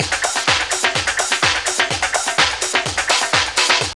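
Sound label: drum loop; X-Static Goldmine 3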